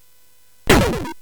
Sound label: Dark Castle, CSG8580R5